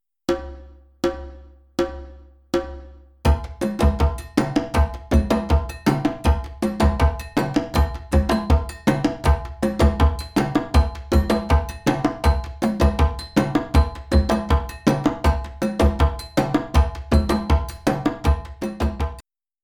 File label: Ensemble